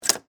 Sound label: Electric Typewriter, Type, Single, Key, Swintec, 8014-S; Technology; Typing